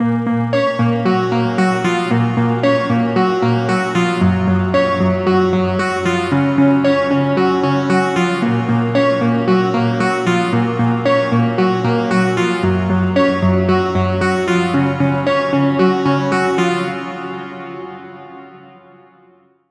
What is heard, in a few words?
crystals; porphyra hybrid by ocean swift synthesis